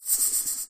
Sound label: snake hissing